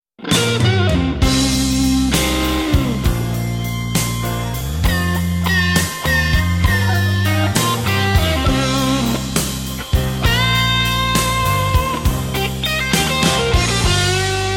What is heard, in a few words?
blues one